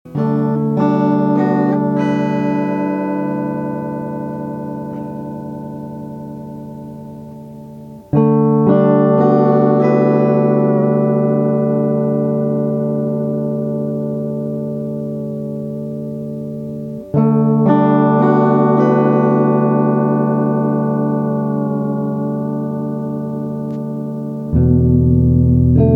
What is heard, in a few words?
decade sequencer; CD4017